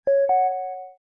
Success; LG KV500 Cookie

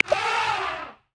African Bush Elephant; Xiaomi Redmi-Note-8-Pro

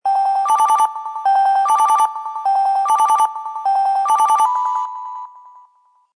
Standard, Ringtone; HTC Freestyle

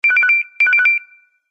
Simple Beep

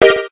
digital, keytone

